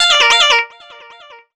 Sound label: Cry Alert; Sony Ericsson; C901; PlusFourSix; Lars Edvall